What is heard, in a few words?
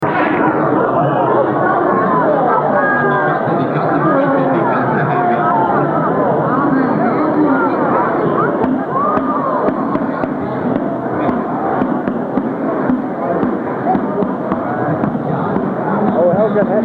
shrine dictorphone mexico, street recording